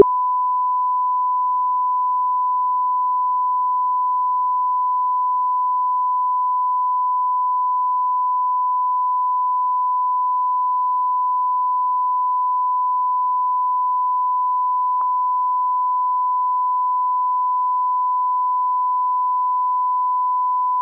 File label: Crosstalk; Rohde & Schwarz; UPA; Rohde & Schwarz UPA-CD